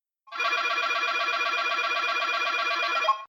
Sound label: win light; Fruit King; CQ9